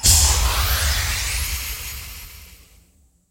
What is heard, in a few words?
nitro; DanisRace